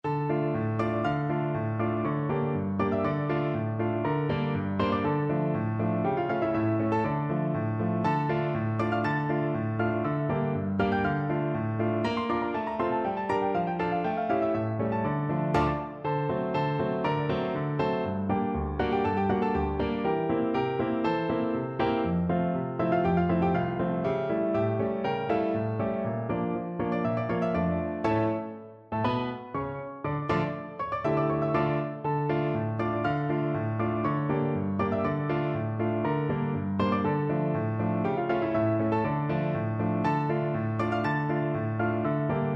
piano, piano duet

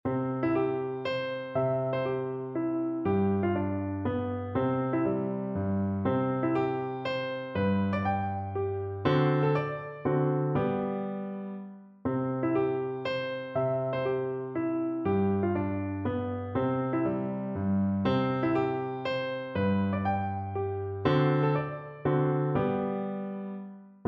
waltzes, piano; aguado

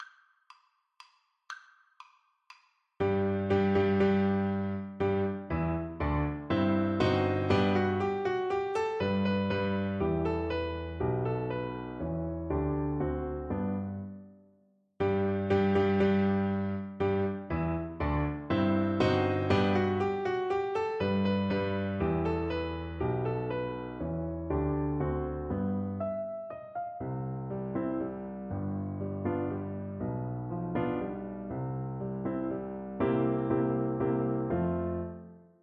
Oboe

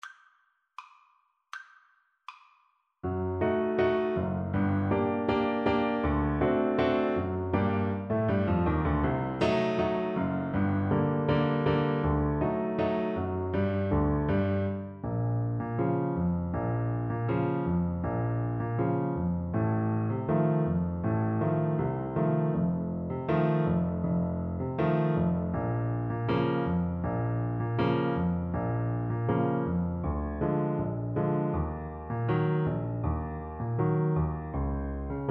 French Horn

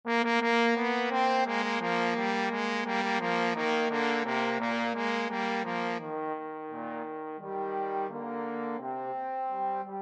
anchors aweigh trombone duet

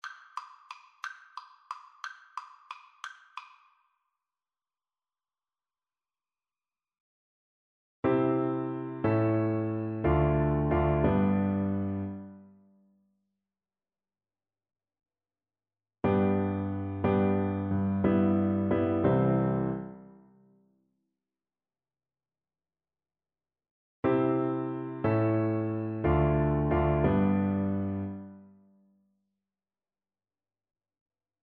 blow the man down, Flute

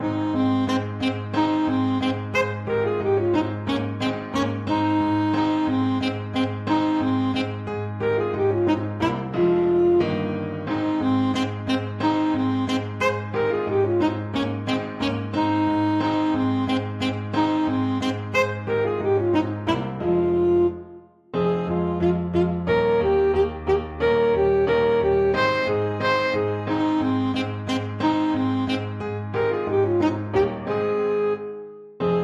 theme ASAX, saxophone

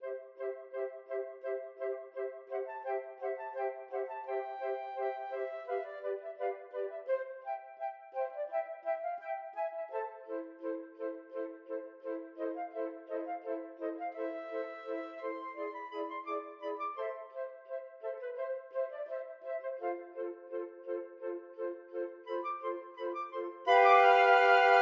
flute quartet

